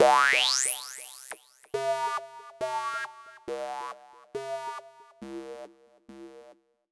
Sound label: fuzz